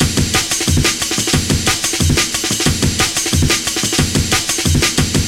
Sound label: amen; Amen Breaks